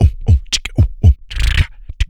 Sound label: CHICKA ROLL, Vocal Planet, Blues Soul; Spectrasonics